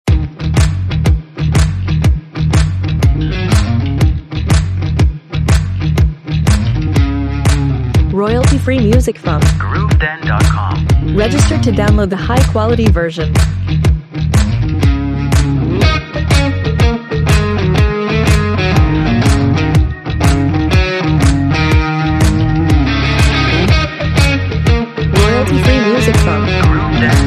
Energetic And Drive Indie Rock, Steady rock blues soundtrack with gritty electric guitars and bass